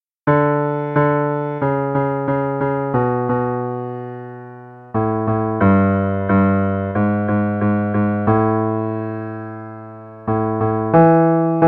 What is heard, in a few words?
bass